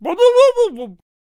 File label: Potionseller Attack Vocals